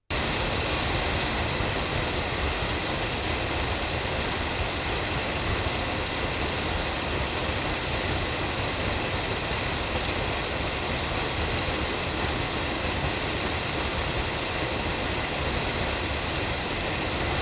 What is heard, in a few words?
loudspeakers; ADK A48